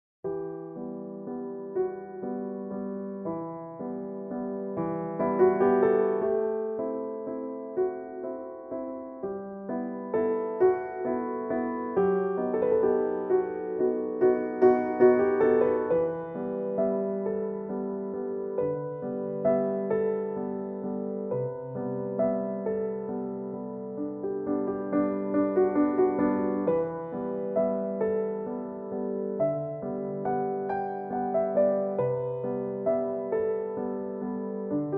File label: waltz; autumn rain